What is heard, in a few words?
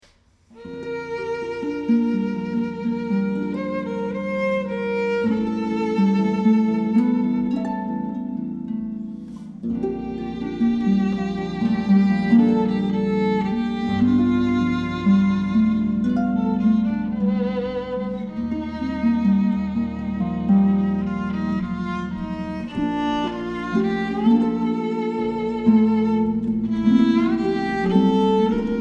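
dream with violin, harp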